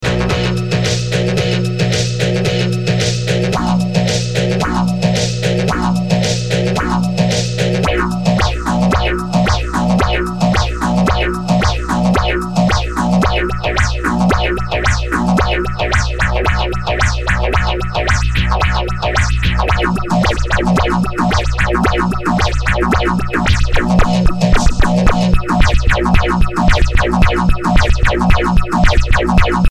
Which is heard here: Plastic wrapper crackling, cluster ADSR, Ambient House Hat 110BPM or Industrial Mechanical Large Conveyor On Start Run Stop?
cluster ADSR